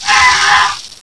pit worm angry

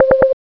blip

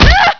spank orgasm

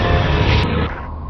alert

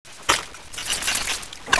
saw reload

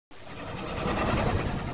gonome idle

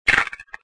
zombie chew

